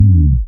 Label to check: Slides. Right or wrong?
Right